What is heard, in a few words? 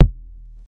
fluffy kick drum sample e key; kick drum samples